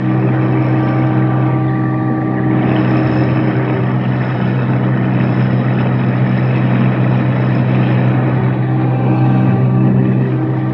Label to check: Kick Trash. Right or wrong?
Wrong